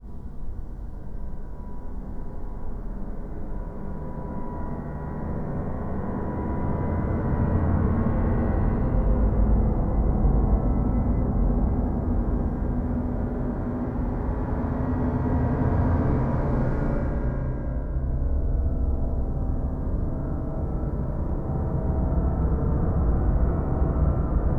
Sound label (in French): caverne seul